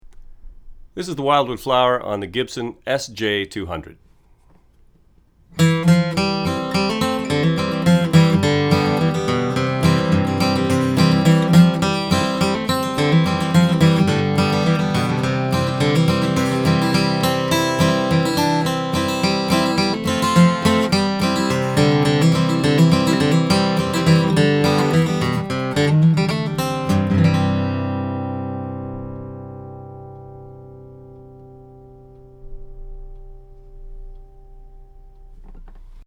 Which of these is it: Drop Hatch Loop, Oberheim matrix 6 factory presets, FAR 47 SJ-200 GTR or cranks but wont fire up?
FAR 47 SJ-200 GTR